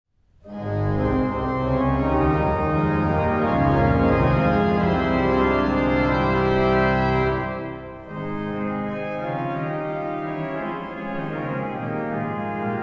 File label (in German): praeludium glocken, orgeln; heiligenbronn